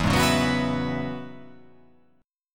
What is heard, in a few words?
E 6th Add 9th E down